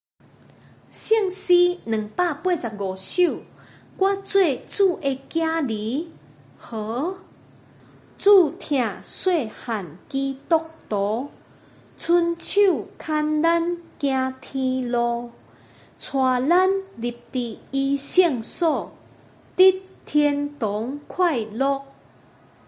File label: Chorus, children; im a little pilgrim